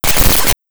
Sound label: Rhythm